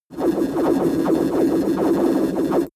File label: electric vortex; laerm